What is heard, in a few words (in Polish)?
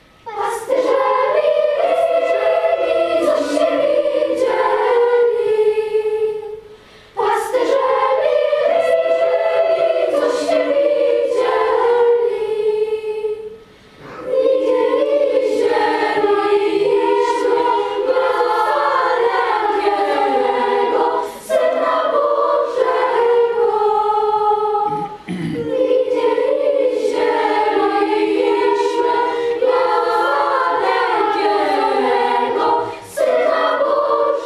chor